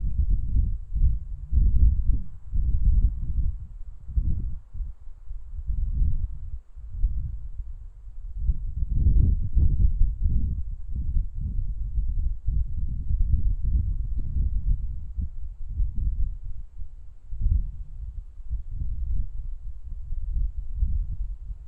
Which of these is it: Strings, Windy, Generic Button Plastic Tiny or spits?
Windy